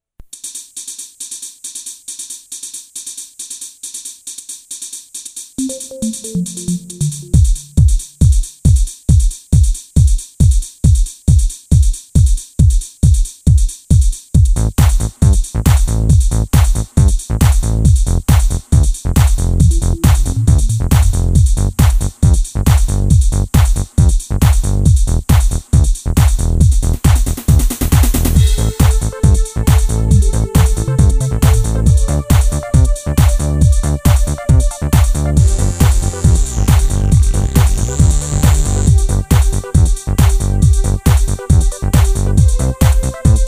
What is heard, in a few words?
Electronic Blip It; Unclassified Electronic